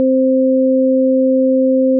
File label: chord